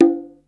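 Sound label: conga open, Yamaha Motif ES drum machine; 300 Drum Machines; Yamaha Motif ES-Series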